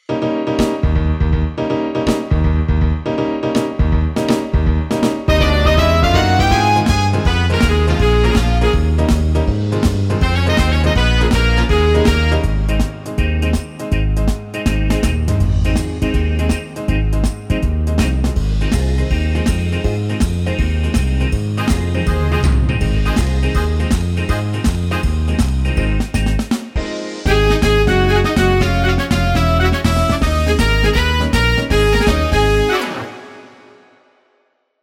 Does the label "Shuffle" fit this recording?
Yes